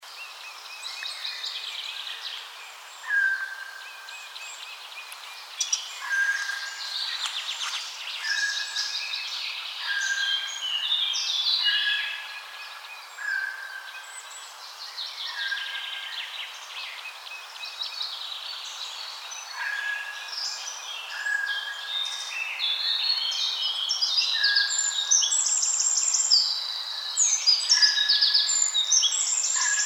Garrulus glandarius; SETE

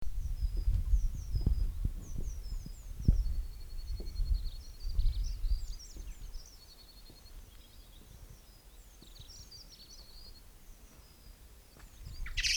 Turdus merula; SETE

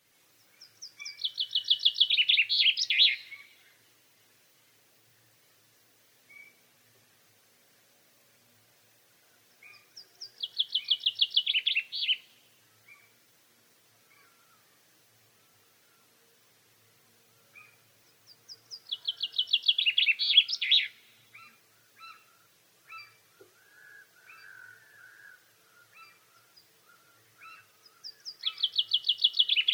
Fringilla coelebs; SETE

